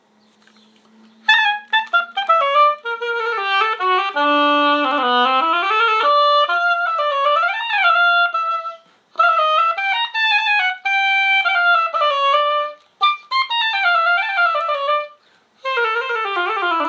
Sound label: adjust Legere Noodles